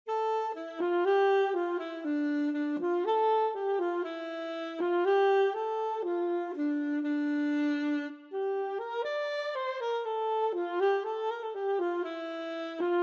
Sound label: Saxophone alto; Tetris